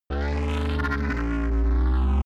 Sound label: low engine rumble